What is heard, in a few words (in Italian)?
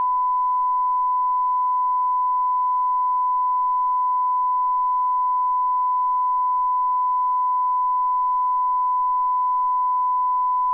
Calibrazione 94dB